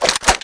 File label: GUN, items; svencoop; aliens items